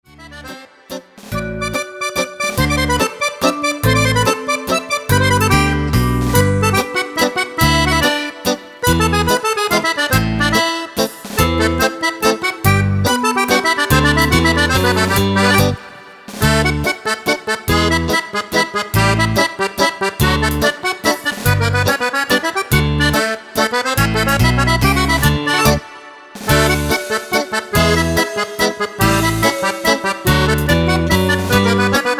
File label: mazurka